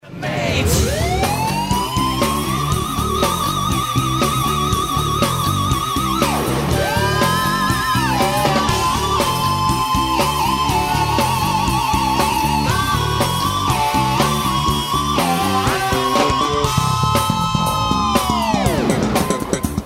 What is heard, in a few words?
Double Solo Studio Version